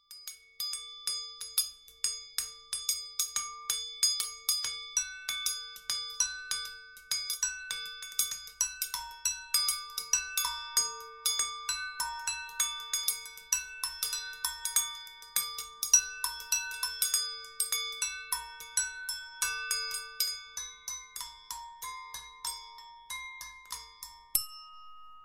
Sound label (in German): Gläser